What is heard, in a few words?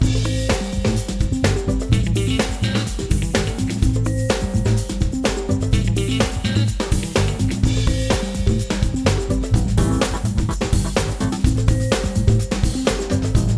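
Music Classic Loop; Pictionary; NDS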